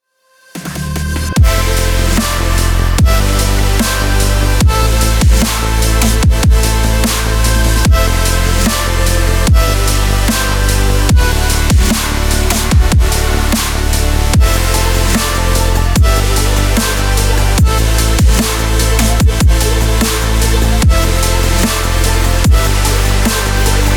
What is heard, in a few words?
emotional energy dubstep